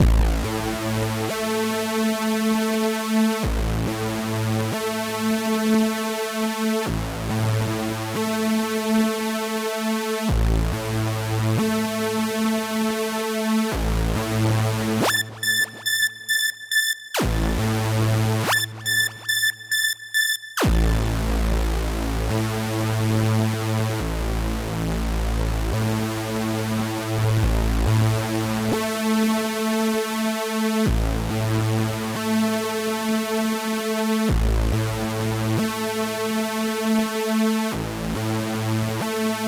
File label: SYNTH